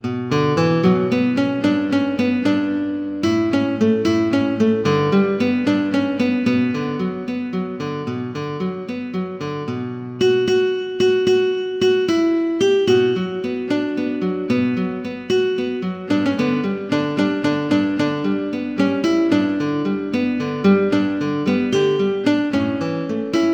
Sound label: synth